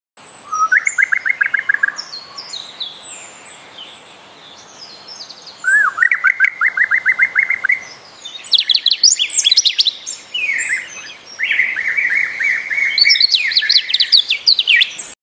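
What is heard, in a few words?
Red crested turaco, bird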